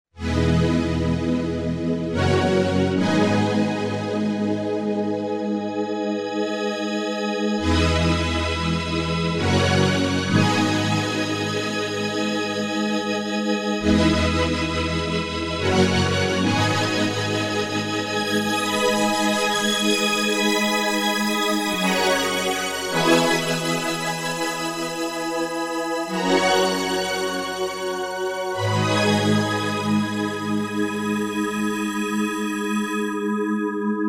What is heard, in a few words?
Gold Silk, yamaha sy77 pads